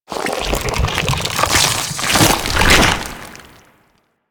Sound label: Infested Bone Blade Scatter Shot Start; Warframe